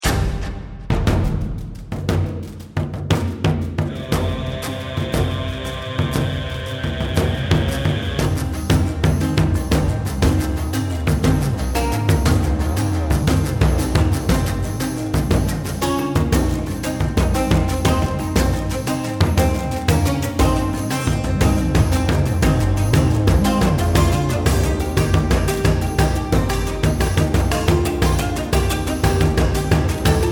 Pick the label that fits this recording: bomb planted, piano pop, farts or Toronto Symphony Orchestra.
bomb planted